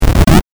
8bit ゴリラ

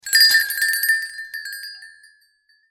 ドアチャイム

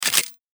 カメラシャッター

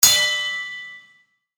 剣ぶつかり合い

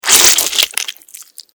クリーチャーが食べる音

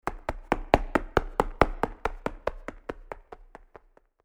足音

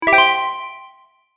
正解

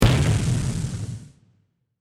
大砲

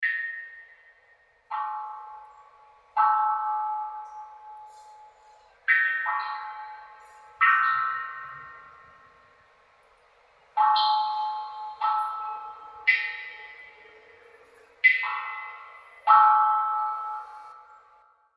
水琴窟

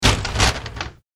扉が開く

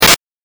8bit ダメージ

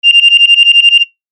電話着信音

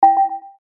決定

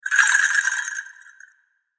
神社の本坪鈴

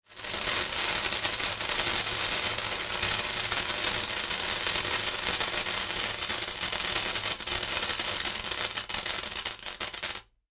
手持ち花火

